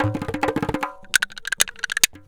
KARTH, Indian Traditions; USB Soundscan vol.45; AKAI

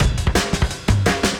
Beat Style E 170, breaks; 170bpm

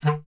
B. Clarinet

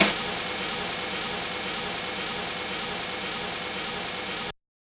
Brush Swirl with Attack; Yamaha DJX-2B

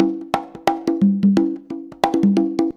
CONGA, Modern Percussion Loops

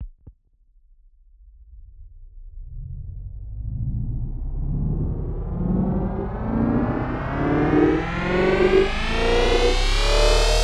Rises; neuro; Optiv & BTK

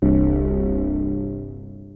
PPG C1, Synthesizer; Hollywood Edge; Giorgio Moroder Rare Synthesizer Collection; PPG.2.3

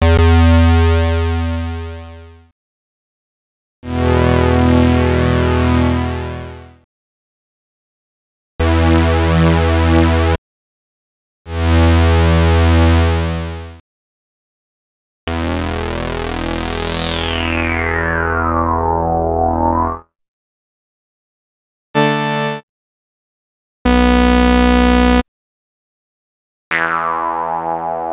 Tone; X-Static Goldmine 5

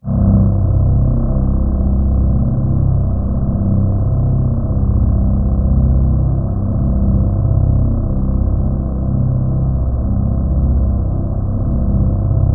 C1, Synth Line

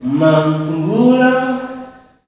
"MUM", MALE; Spectrasonics; Heart of Africa 2; S.MALEAMB

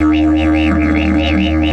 EF 140, DIDGE RIFFS

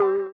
VIBRA WAH, guitar; Spectrasonics; Bizarre Guitar

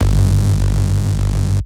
bass fathoms rich saw; Polyend; Recue

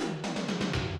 120, Classic Drum Loops

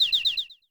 Bird

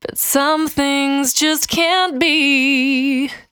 Cry 68bpm B, Vocal; Zero-G

